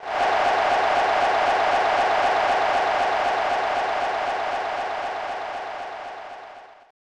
Excited Crowd; snes; super bases loaded 3

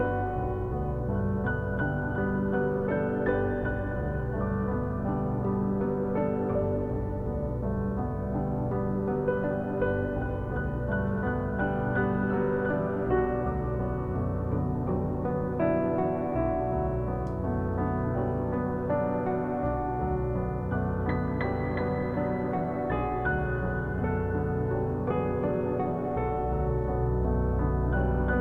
sorrow Loop

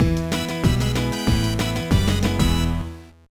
Jingle, gba; Backyard Baseball 2007

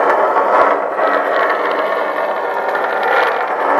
Physics Metal Grenade Scrape Rough Loop; Counter-Strike: Global Offensive

